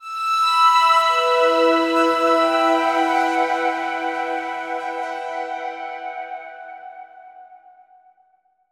Synth Emin; Torchlight III; PS4

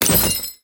Jingle New Weapon Unlock; black command

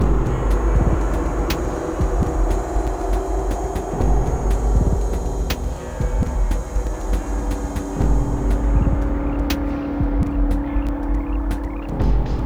Airlock Soundscape Climax; Rive Wreck Hack Die Retry; ps4

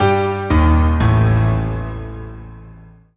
Solo Piano Negative; MUSIC EFFECT; startide

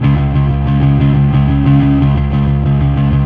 Fuzz C sharp 2

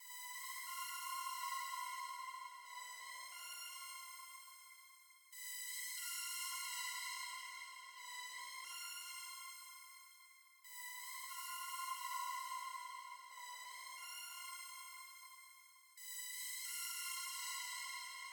Synth myst; Toki Tori 2

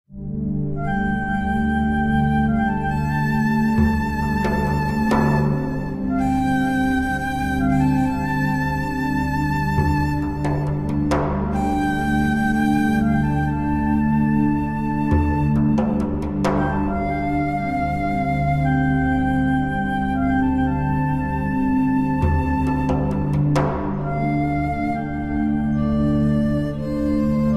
Music Thai Calm; Thailand

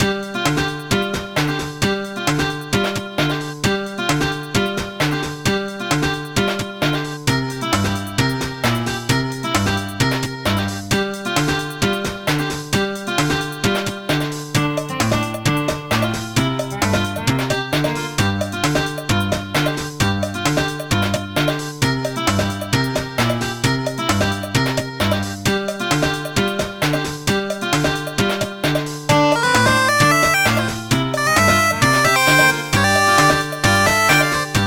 Exploration; barnyard; game boy advance gba

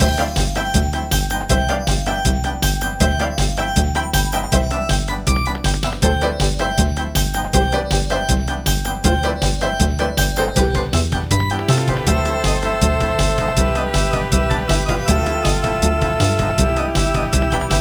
win; NiGHTS Into Dreams... (PS2) (gamerip) (2008)

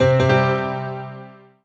Solo Piano Positive; MUSIC EFFECT; startide

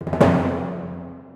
Timp rollin; Torchlight III; PS4

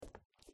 Tile step; The Joy of Creation Halloween

